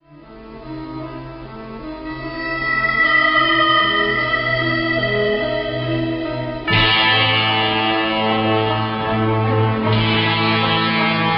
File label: harmonicam